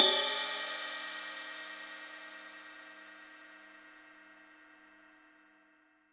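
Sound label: cymbal, Real Drum Kit, FUNK KIT; Best Service ProSamples vol.43 - Real Drum Kits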